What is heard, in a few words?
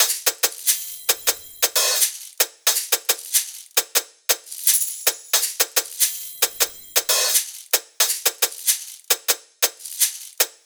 hat loop 90, Drums; Pop; Hat Loops; oakdale; Slate; SD PASADENA Pop Sample Pack